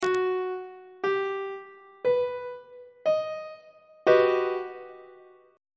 f double sharp augmented major 7th 3rd inversion on treble clef